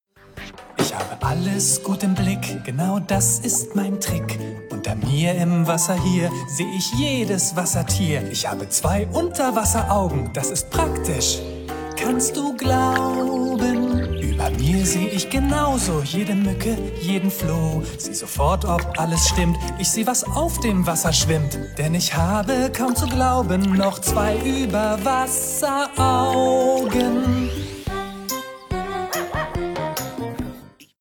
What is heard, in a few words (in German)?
gesang